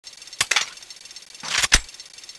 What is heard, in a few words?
reload, weapons; thanatos1